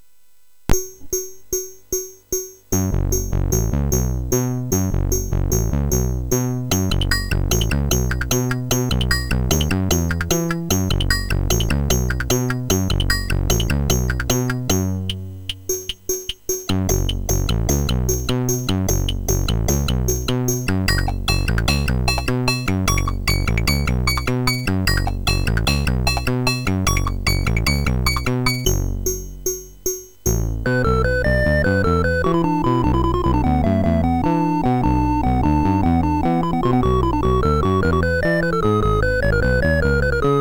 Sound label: Music Demo Version, MOS6581R3; hvsc